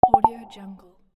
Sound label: interface hint